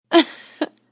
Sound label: Woman Short Chuckle, A woman chuckles; Laughter